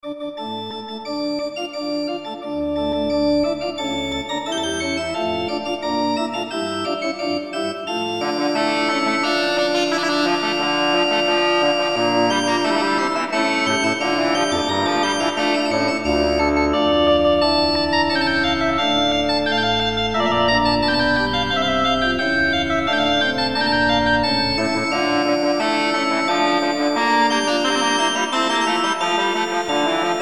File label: Basse de Trompette; Marchand